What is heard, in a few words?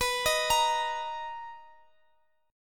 B Major 7th B down Guitar Drop D